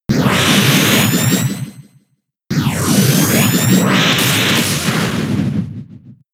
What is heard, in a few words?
Focus Punch; Pokemon; gen 6 attack moves; XY ORAS